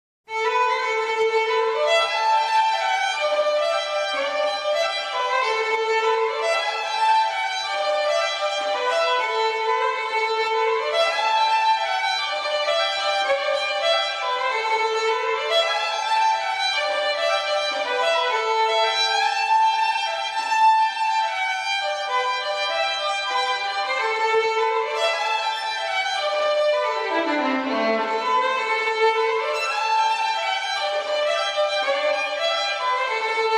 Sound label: Irish Tune; Violin